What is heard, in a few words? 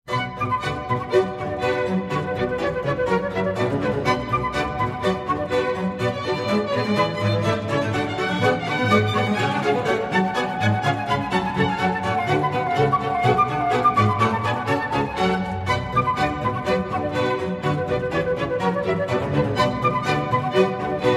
Orchestral Suite in B Minor, Badinerie; Orchestral Suite No. 2 in B Minor, BWV 1067; VII. Badinerie; Best of Bach; Jiri Reinberger